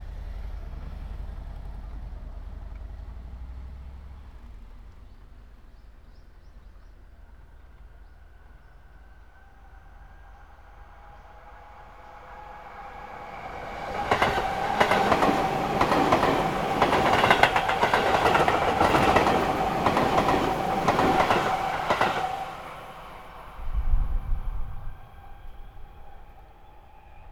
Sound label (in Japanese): 下り電車通過。; H1 XLR 外部マイク SONY ECM-989 MSマイク指向性90°＋Rycote BBG Windjammer; 録音したファイルを、本体でノーマライズしました。